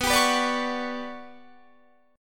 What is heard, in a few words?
G Major Sharp 11th B, chord